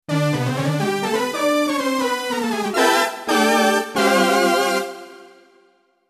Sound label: BRASS; d-50